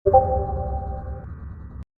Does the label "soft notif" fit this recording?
Yes